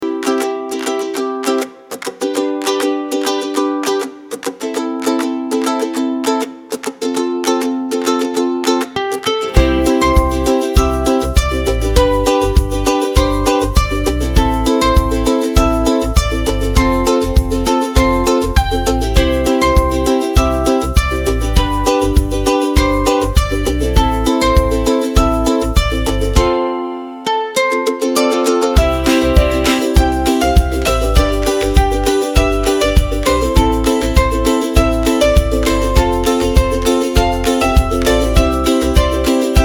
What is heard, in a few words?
happy music, schastlivaia detskaia muzyka